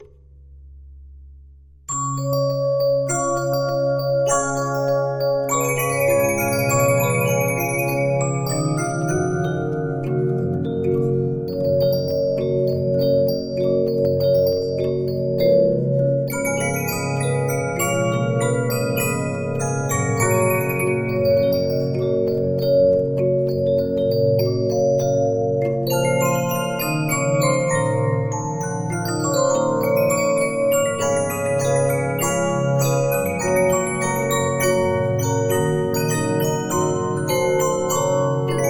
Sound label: Regina, 15inch disc musicbox